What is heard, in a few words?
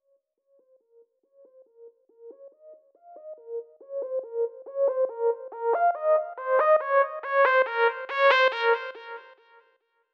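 Break Melo Filtered, 140 BPM; Vengeance - Essential Clubsounds Vol.5; Demo Constr Kits; Catch a Plane Kit; Root A#min; Synth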